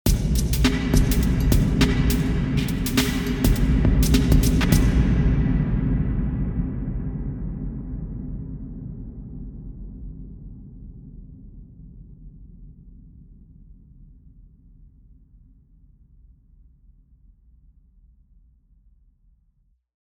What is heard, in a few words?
drum, hamilton mausoleum